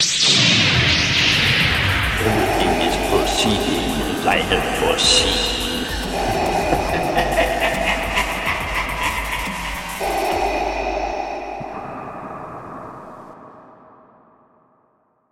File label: Star Wars Lightsaber